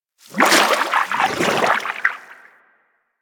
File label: Fishing Spear Fish Spook; Warframe; Weapons; Eidolon Fishing Spears